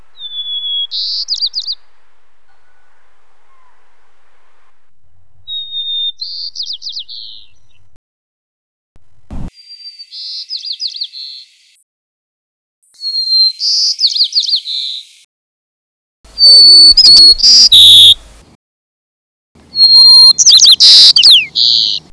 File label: White Crowned Sparrow series, local, CA, AK